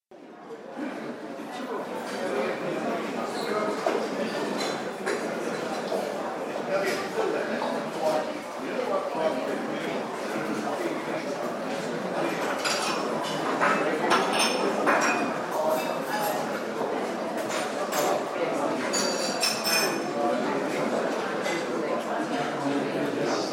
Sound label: Busy Open Plan Hotel Reception And Cafe; Building Sound Effects